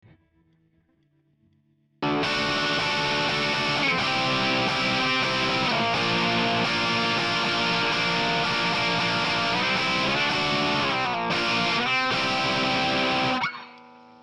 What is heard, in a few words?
Vintage Amp Room; Softube